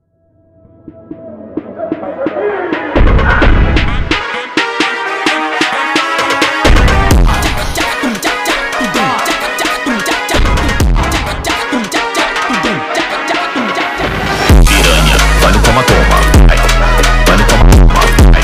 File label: mexican funk